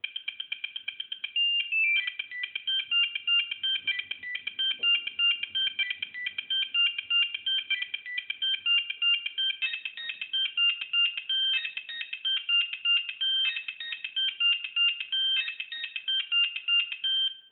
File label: nokia 1100 techno ringtone